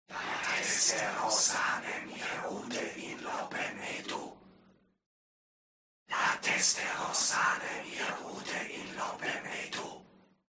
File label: HUMAN CROWD Evoke Whisper; smartsound